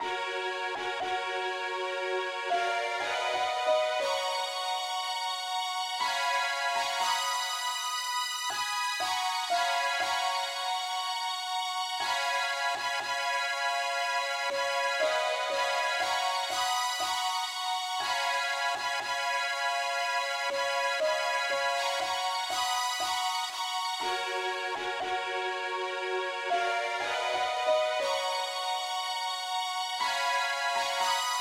uke lead key ges; pilot

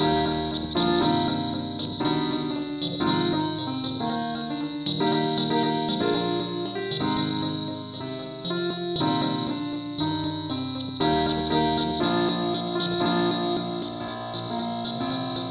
ukelele key ees

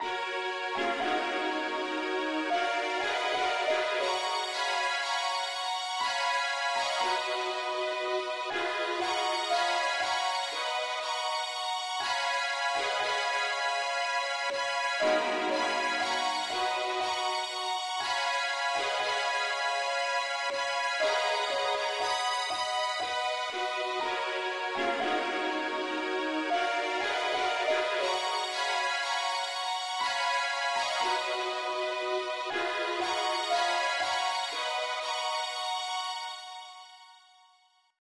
pop uke lead key ges

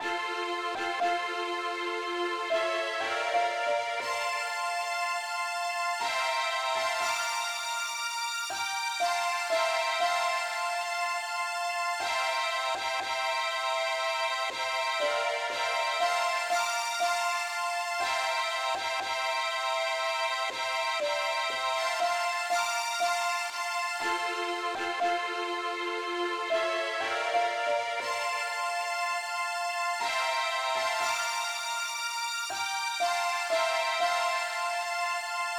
mandolin Lead, key f

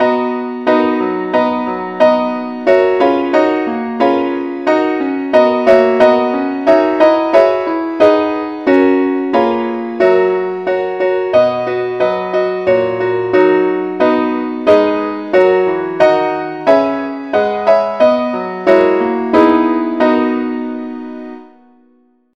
keyboard, key B; saintthomas

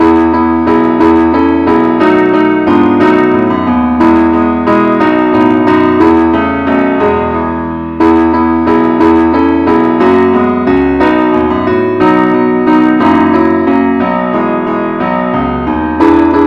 keyboard only, key E; angelvoices

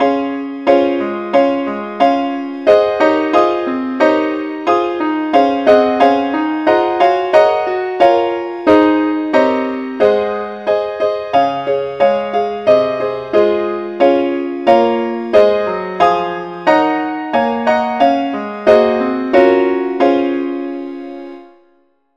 keyboard key cis; saintthomas

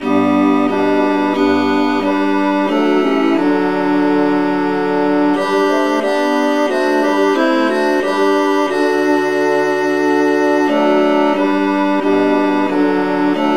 traditional key G

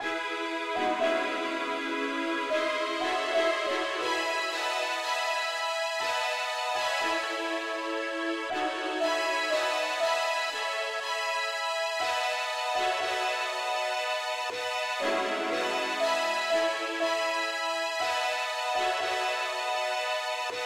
popukelead key e

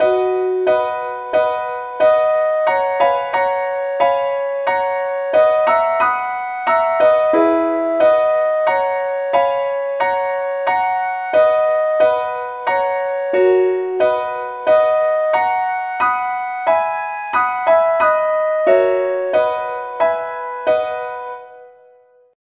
lead sheet, key B

